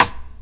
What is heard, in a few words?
Rim 3k Sharp, Drums